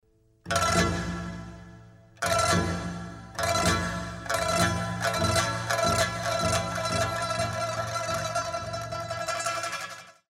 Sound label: round ten sec count; music kits custom